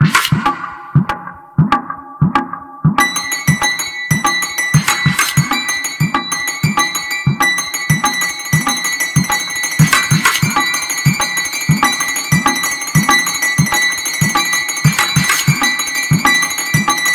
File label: lg v10 rhythmic ringtone